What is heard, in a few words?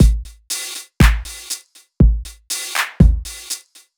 Housetime, Loops